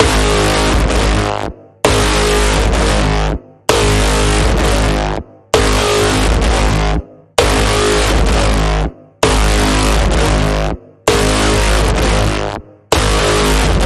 Pulsing Code Generator, G sharp

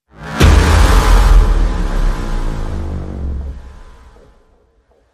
FX Hit, Sting

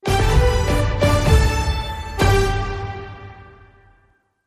Logo Only Orchestra

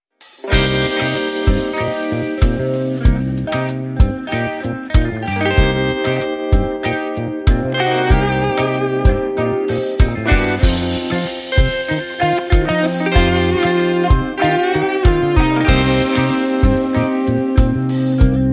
Blues

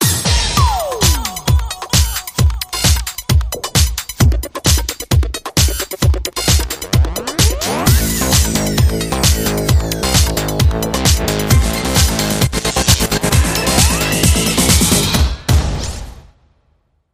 Electro Tech Ramp

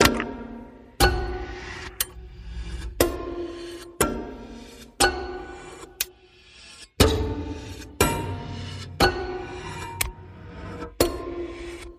Stirring Creatures Pluck Pulse

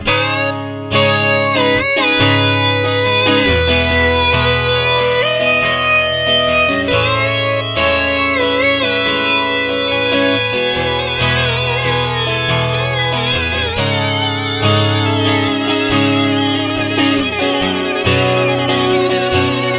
Crazy Synths

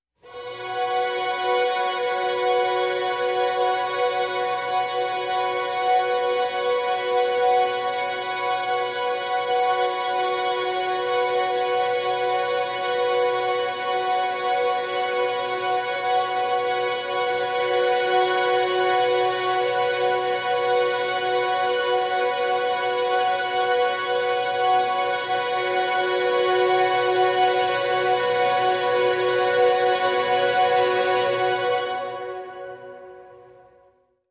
Collective Strings B

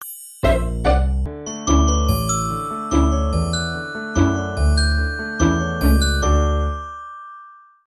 Tick Tock

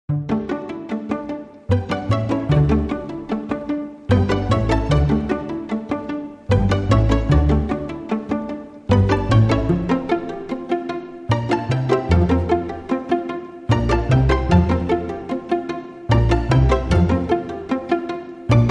Strings Only

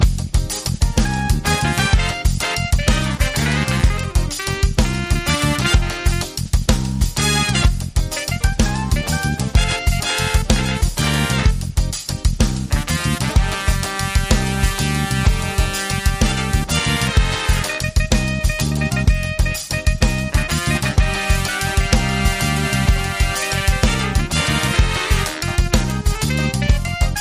Brass

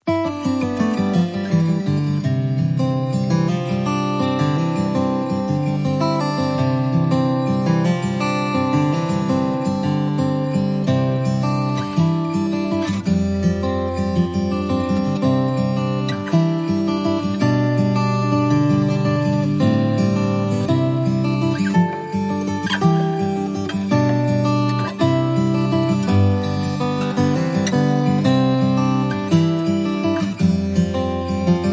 No Glockenspiel No Ukulele

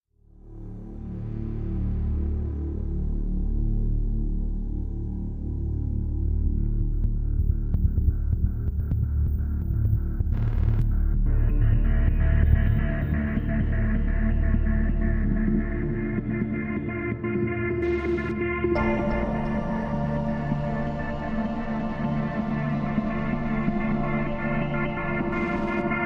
Lift Off Drone

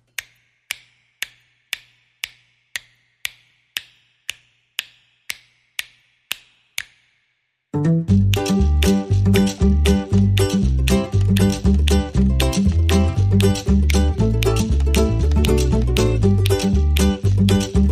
Jingle Bells, No Leads

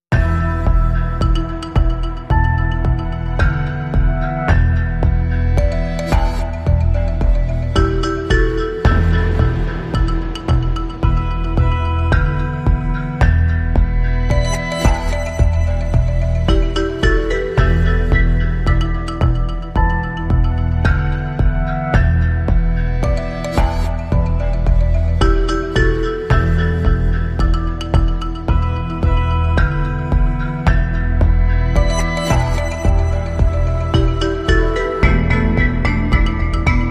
No Guitars

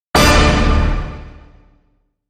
Hit Orch Lonely Heart D

